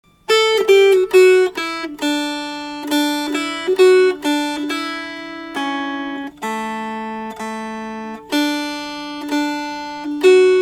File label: Melody